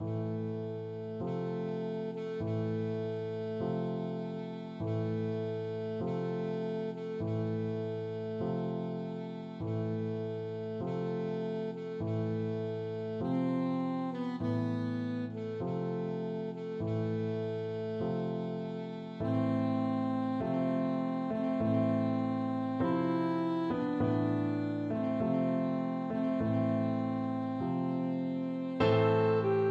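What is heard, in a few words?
funeral march alto saxophone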